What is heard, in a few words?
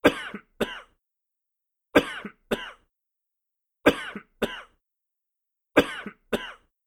RINGTONE